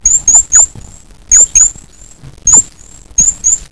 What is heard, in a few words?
bleu cock